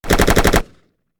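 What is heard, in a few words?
m 16 exterior shots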